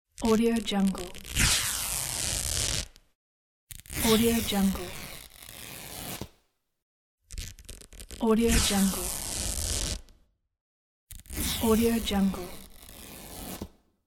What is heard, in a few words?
ripping thin clothes